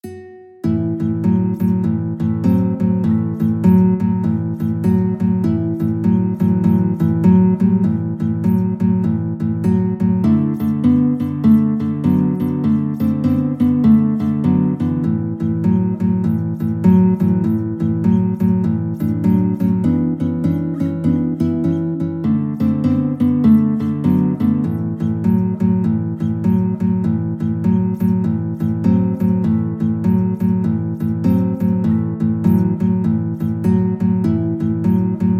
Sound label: blues, guitar duet